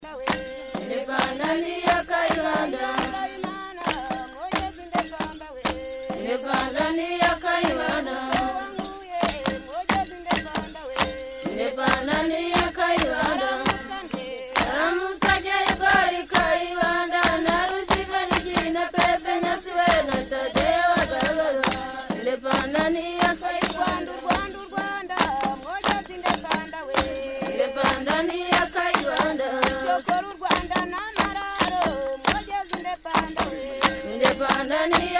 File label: amayugi